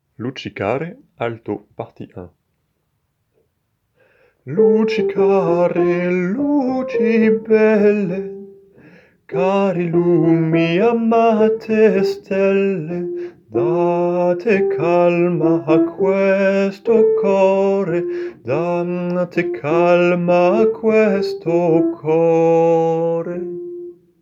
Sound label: Luci care alto partie; W.A. Mozart; Luci care luci belle-1°partie